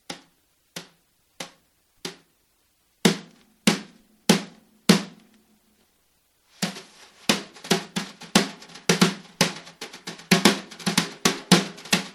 tap, brushes

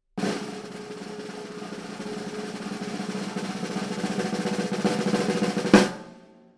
coated roll

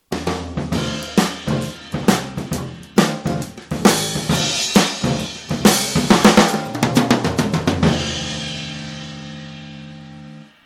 brushes

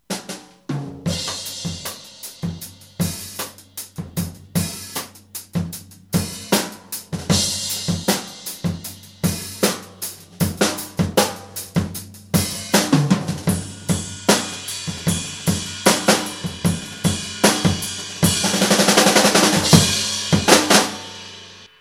drumline; ORANGE COUNTY; 20PLY VENTED; 14X6 TYPE2

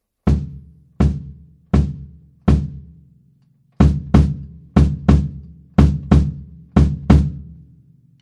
kickport

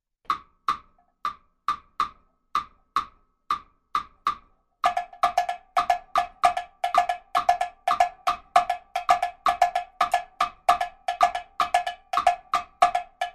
rumba

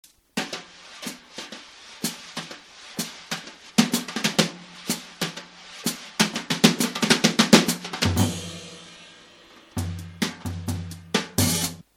brite, brushes

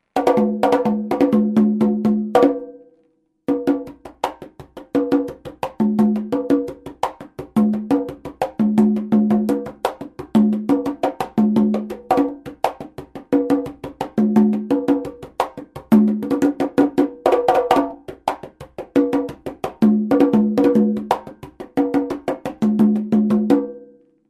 quinto tumbadora sound

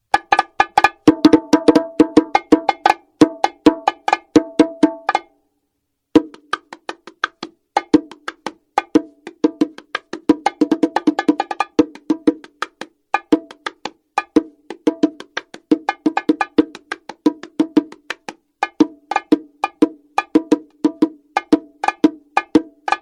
bongo, rim

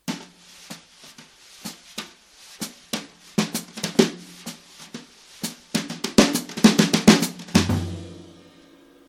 classic, brushes

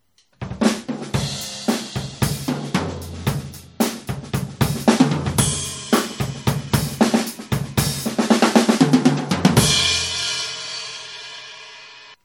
birch, drumline; CLASSIC BIRCH; BD22X16 TT12X8 13X9 FT16X16

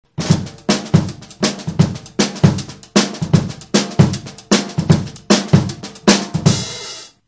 ludwig 416 d; BLACK BEAUTY; 80's LB416 14X5

